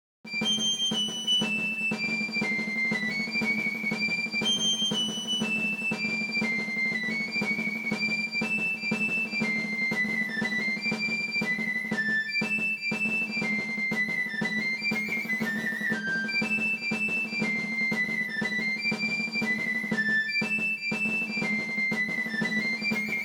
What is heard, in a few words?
charge